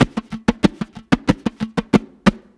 Mondo Head, Brushes, Perc Loops; Sonic Foundry; Loops & Samples Drum Tools

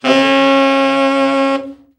BRA TENOR OB, SAX

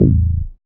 dance bass; Sound & Vision - Gigapack II CD 1

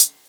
Closed Hihat, House; Drums; Cymbal Stuff

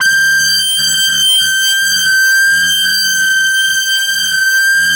FLYER G5, Yamaha VP-1; Music Store; 90's Sample CDs

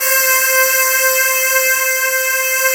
girl C5, vox; FAIRLYVOX; Synth

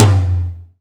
RFLAT, Drum Mashines, SR-16 DRUMS; EdgeSounds

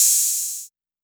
808 Hop, Roland MV-8008; Roland MV8800

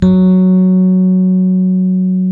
Suitcase E.P F#2; E-MU Producer Series Vol. 2 – More Studio Essentials; Keyboards

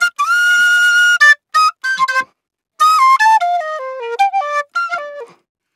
Penny 075bpm Bb, Penny Whistle, African

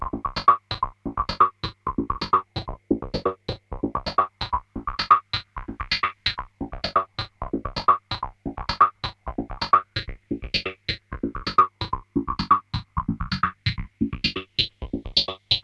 EMS LOOP; ELEC-LOOPS; Syntec; Wall of Sounds; TECHNO-BOX

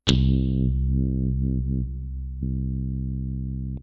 Squelch, Synths BASS

